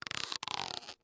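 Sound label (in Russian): ras, zvuk rastiagivaniia, stretching sound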